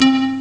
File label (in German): Holz; ST-XX Amiga Soundtracker Sample Packs